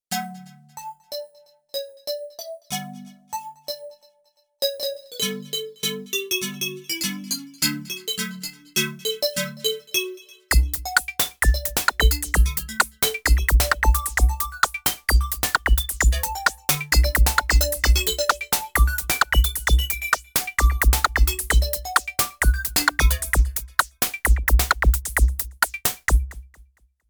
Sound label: Glasses, Korg Radias-R